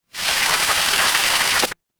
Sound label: water stream